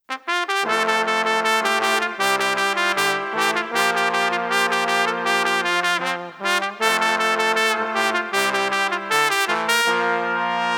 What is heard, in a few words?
New Crossover Brass; Jack Russels Nackert